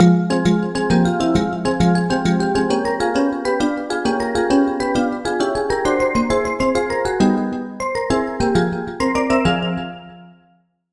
Bell; Prophet Lamp; Routed Sound; Vital Expansion - Story Walker Vol.1

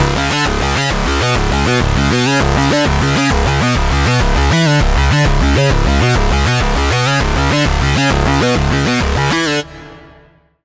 Lead, Sylenth1; Routed Sound; Sylenth1 Expansion - Quantum Enforcer Vol.1

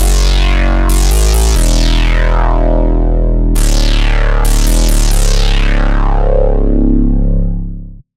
Bass - Old School, Serum 2; Routed Sound; Serum 2 Expansion - Reality Maker Vol.1

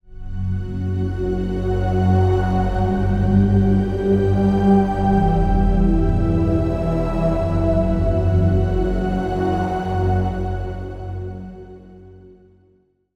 Pad, Sylenth1; Routed Sound; Sylenth1 Expansion - Star Scavenger Vol.1

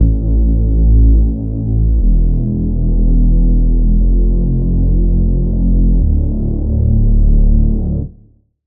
Reese; Vital Expansion; Street Vanquisher Vol.1; Routed Sound

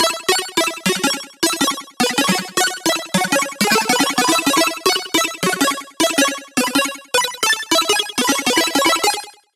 Pluck; Bit Spark; Routed Sound; Serum 2 Expansion - Hyper Gamer Vol.1

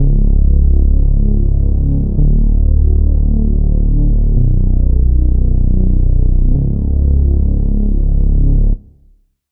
Reese, Sylenth1; Reese - Bootleg Liquor; Routed Sound; Sylenth1 Expansion - Noble Gangster Vol.1

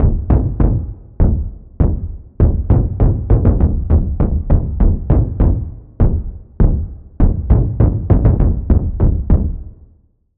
Impact, Sylenth1; Impact - Surge; Routed Sound; Sylenth1 Expansion - Quantum Enforcer Vol.1

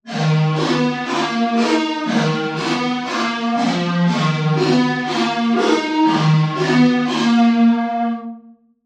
Lead; Routed Sound; Spire Expansion - Street Vanquisher Vol.1

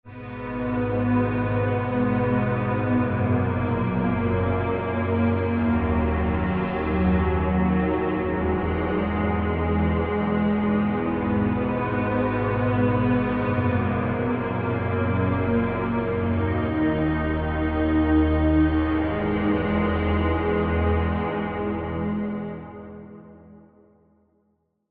Pad; Waning Twilight; Routed Sound; Vital Expansion - Flame Summoner Vol.1

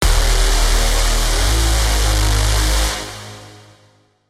Braam; Routed Sound; Serum 2 Expansion - Quantum Enforcer Vol.1

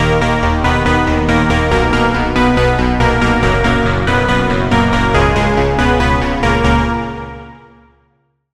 Key, Serum 2; Key - Wonder City; Routed Sound; Serum 2 Expansion - Reality Maker Vol.1

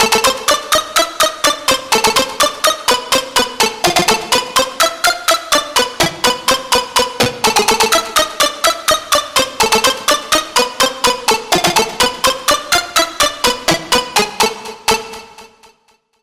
Lead; Super Coin; Routed Sound; Vital Expansion - Hyper Gamer Vol.1

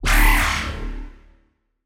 Wobble; Dirty Water; Routed Sound; Vital Expansion - Chaos Bringer Vol.1

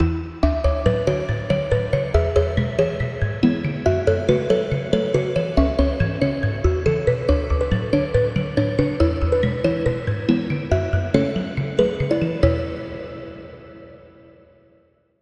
Pluck; Routed Sound; Spire Expansion - Story Walker Vol.1

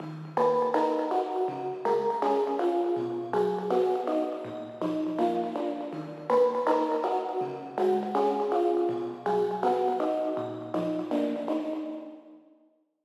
Pluck; Vital Expansion; Street Vanquisher Vol.1; Routed Sound

